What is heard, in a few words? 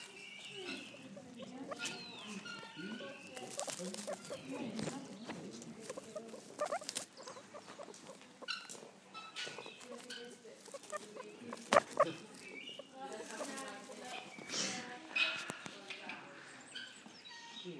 guinea pig